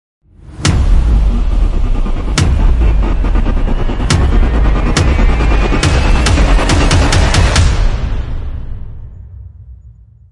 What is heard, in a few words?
Black Burst; Ninja Tracks